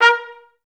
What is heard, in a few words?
TR STAC A#3; Best Service; Peter Siedlaczek's Advanced Orchestra